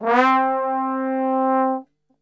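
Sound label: C 3 trombone glissando, Brass Super Section; Best Service; TRMB GLISS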